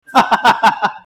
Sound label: Man laughing